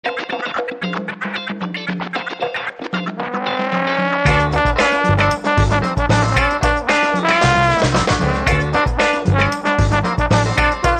Percussive Muscle

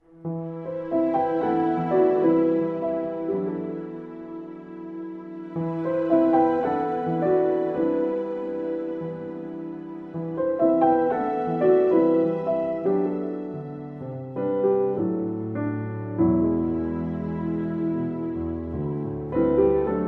With Strings

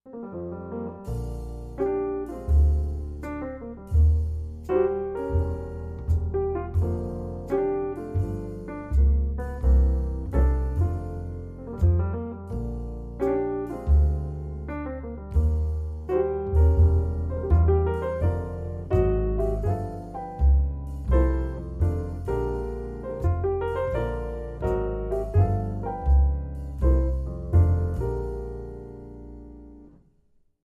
With Trio

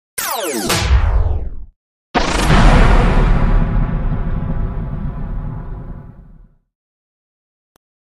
Spaceshot Sonic Explosion

